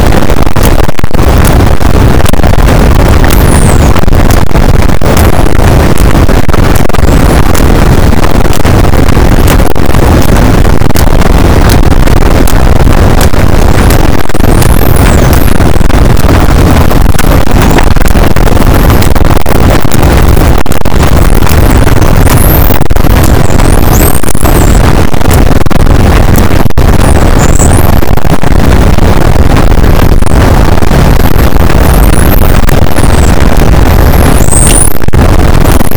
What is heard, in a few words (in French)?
cachalot positif, boat; Physeter Macrocephalus